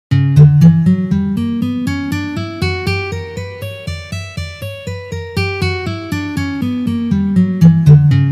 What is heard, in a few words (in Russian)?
Gamma Si minor garmonicheskij vid pozitsiya